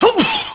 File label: yell; Ronin